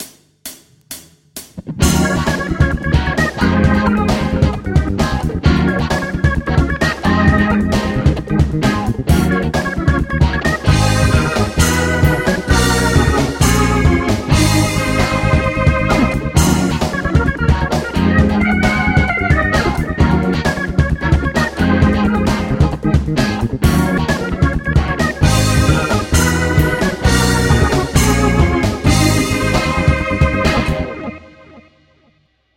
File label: Dance Machine